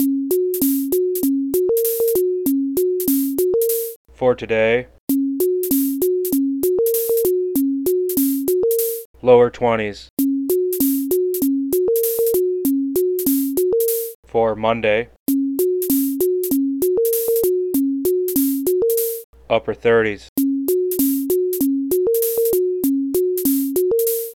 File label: Weather Song Test Seq